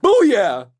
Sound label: police hit good short; donnie; Blind Drive